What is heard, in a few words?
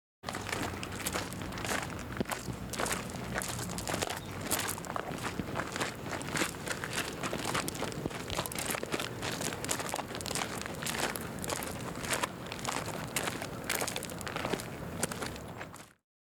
footsteps on a grass; Grass and Dirt; Butterfinger Video Sound Effects